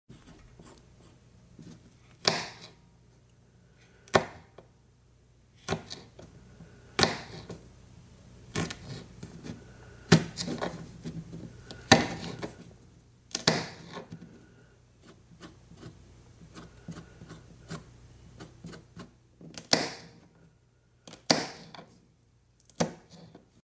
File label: Cutting Fruit Sound Effect